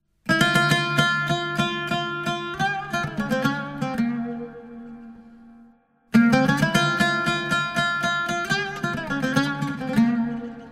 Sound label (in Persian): Taknavazi-e Oud